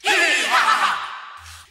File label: cheeeyah crowd start sumptin; ooh ahh